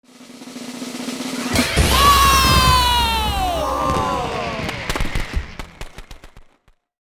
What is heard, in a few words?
Summary Complete, ui; aronwk collection; ndaudio